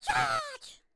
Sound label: kobold flyer charge scream